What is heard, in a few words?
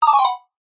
game sound synth mallet style short negative